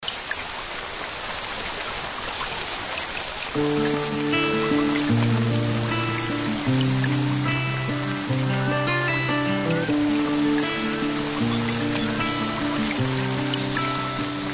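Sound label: mix birdsong and rain music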